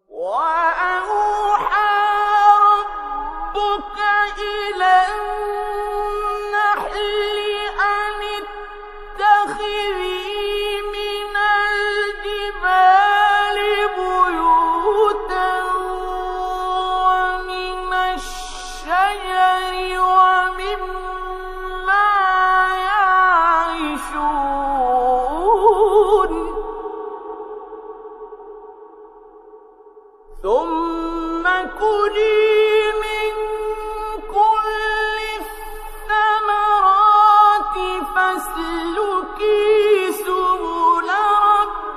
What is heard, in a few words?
Surah Al-Nahl 68-69 recited; Sheikh Abdul-Basit Abdul-Samad